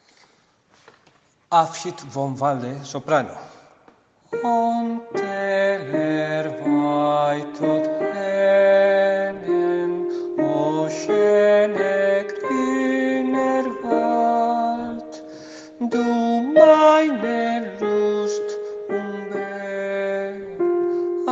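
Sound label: soprano; Abschied vom Walde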